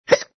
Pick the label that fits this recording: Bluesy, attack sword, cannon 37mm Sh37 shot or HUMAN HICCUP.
HUMAN HICCUP